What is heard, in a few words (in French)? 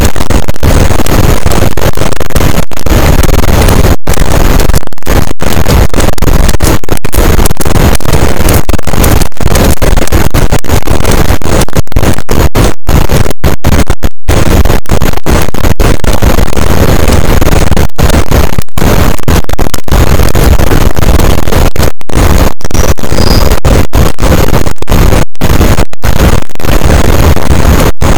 cachalot positif, boat; Physeter Macrocephalus